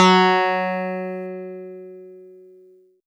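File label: Easy Clav DX100 F#3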